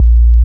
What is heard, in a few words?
808s Sub